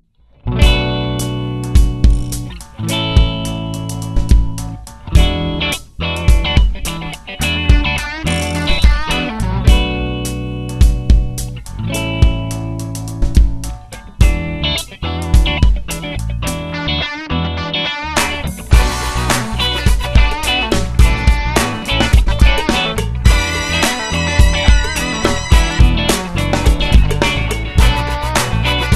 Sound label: funk